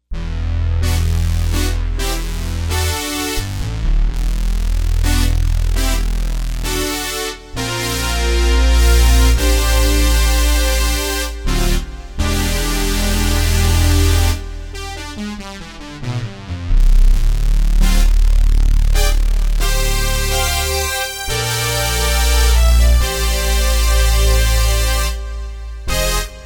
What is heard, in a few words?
obxa brass; dss1 demos